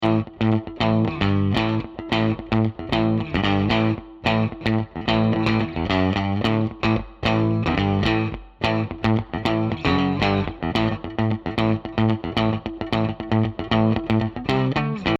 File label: Clean guitar 2 mics in phase; Guitar Miking Examples